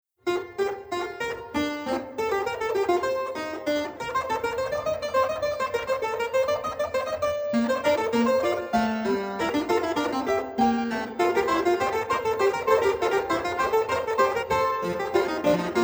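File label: fuge Bb major, Flemish harpsichord; historical instruments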